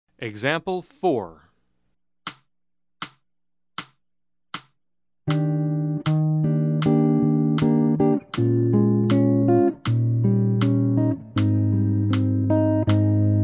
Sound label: Jazz; jue shi ji ta